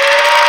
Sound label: minigun spinup; Modern Warfare